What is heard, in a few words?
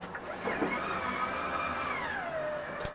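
front door close, volvo 9900; Freyfurt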